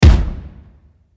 Energetic Upbeat Percussive Action Stomps and Claps Sting